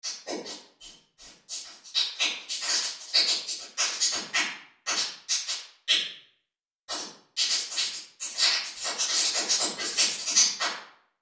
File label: Small room bright; freeverb